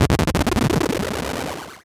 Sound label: Title Transition Delay Short